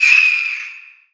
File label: banshee hit; Crypt of the NecroDancer